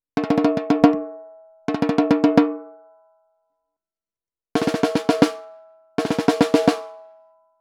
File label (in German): dynamisches Mikrofon Pearl Snare 10x 5 Firecracker Snare close; the t.bone MB-75